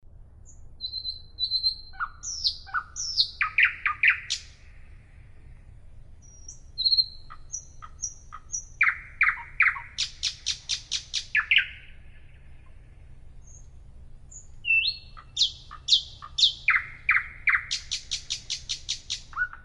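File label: Nightingale song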